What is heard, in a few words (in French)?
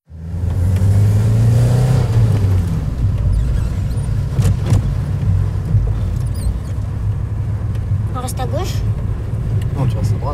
En voiture